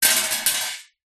metal basket small drop concrete; zapsplat

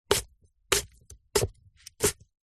apple chop knife wooden chopping board x4; zapsplat

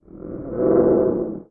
science fiction alien creature growl; sound effects one

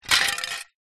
foley plastic thin broken shards drop; zapsplat

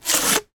poster remove from tube; foley

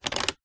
leisure toy slider toggle plastic slide

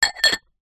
household small ceramic sugar pot lid on; zapsplat

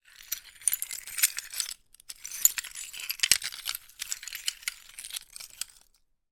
foley tile broken peices movement

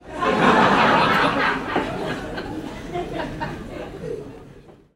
human audience laughter comedy club x200 people; komedia brighton comic boom

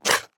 ICE Skate scrape one leg rotate

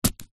horror kitchen knife stab body; zapsplat

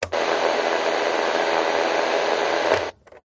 radio switch on static switch off stereo am; zapsplat

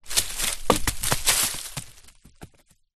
foley rock small roll down bank leaves

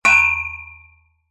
impact metal pole hit with blunt object

